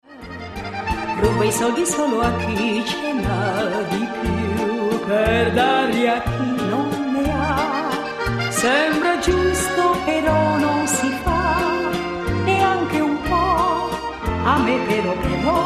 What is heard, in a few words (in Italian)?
fisarmonica frammento; la fisarmonica castellina pasi